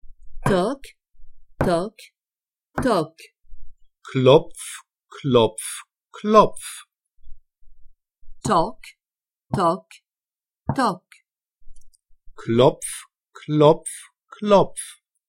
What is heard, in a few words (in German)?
Daumen klopf Comptine toc toc toc